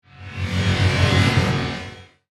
Whoosh Robotic, Production, Element, Whoosh, Robotic, Electric, Feedback